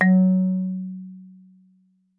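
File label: Marimba rubber fortissimo Gb4; MIS Pitches - 2014; Percussion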